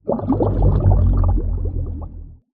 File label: Abyss Drown